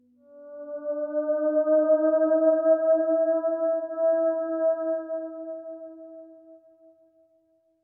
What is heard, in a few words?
Discordant Voices